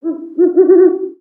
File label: Owl Call; Valiant Summoner